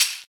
smooth shaker hi hat c sharp key; percussion

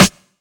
sizzly snare drum f sharp key

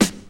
80s clean acoustic snare sound g key; snare drum samples

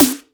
treble heavy snare c sharp key

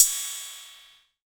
drum crash sound b key; cymbal drum samples

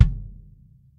tight lows kick single hit f key; kick drum samples

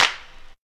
clap single hit E key; percussion; single hits one shots

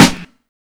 wet snare single hit f key

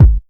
dope kick one shot g sharp key

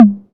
natural tom drum G sharp key

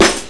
rich top end snare sample f key; snare drum samples

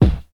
clean kickdrum sound b key

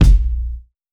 roomy kick one shot a sharp key; kick drum samples

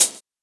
soft pedal hi hat sample c key; hat samples

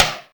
studio acoustic snare sound F sharp key

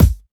shiny kick drum one shot G key; Mono

